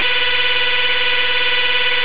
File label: static